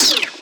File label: vox